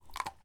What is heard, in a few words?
Dog eat treat grab